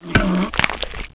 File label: bite; unreal; pupae